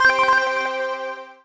COLLECT SUPER; impact

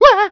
corner, impact

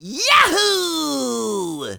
fly vox

